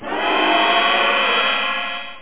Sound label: teleport